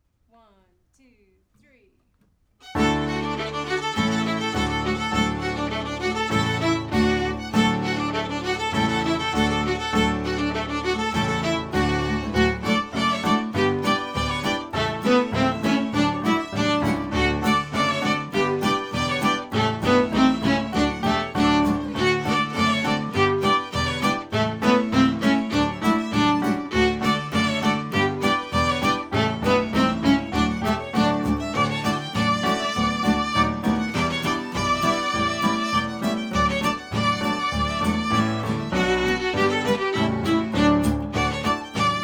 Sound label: fiddle; Ronfleuse Gobeil